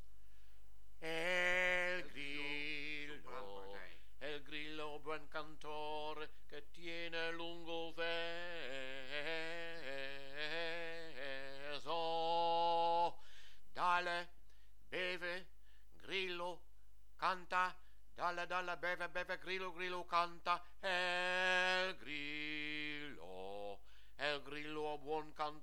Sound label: el grillo alt